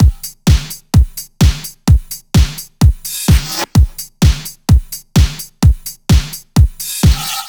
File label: 128BPM Samba Kit; VFH1 128BPM E Samba Kit